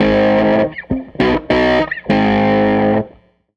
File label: Tele Guitar Loop